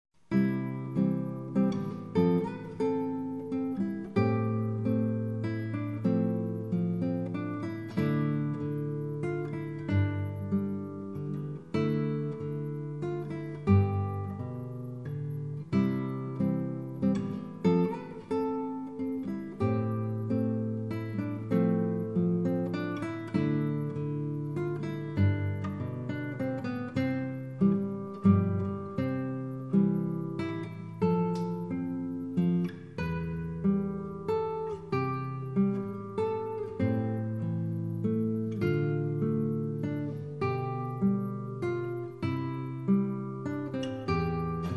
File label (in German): walzer